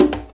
CONGA, percussion; InVision Interactive; Percussion Slam

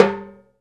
CNTR STRK, Timbales; E-MU Producer Series Vol. 2 – More Studio Essentials; Composer; Timbales Xswitch

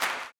CLAP, Blues Soul; Spectrasonics Vocal Planet

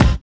collision; Spectrasonics; Metamorphosis

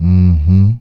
"MMM HMM", Male Vox; E-MU Formula 4000 Series Vol. 1; Hip Hop Nation

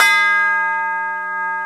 CHIME RELEAS; Kid Nepro; Elite

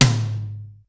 LAUNCH TOM H, drum; Crypton - DrumBase5000

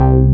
BASS, Techno Trance; E-MU Formula 4000 Series Vol. 2; Orbit Presets

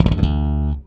Slap One Shot D, Bass; Bass X

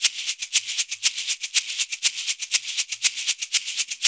Udu Calypso

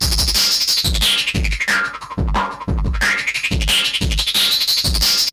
filter distort, drum & bass beats & breaks; Fluid Dynamics

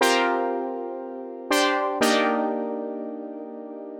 120 Pad Chords C, Keys, Nu Jazz House; PowerFX

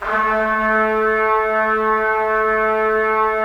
Trumpets; Roland - LA Composer vol. 4

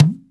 TABLA; AKAI S6000 CD-ROM - Volume 5

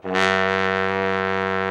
TROMBONE; Best Service Gigapack 1

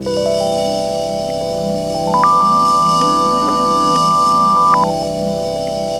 Playboy Rhodes; M8

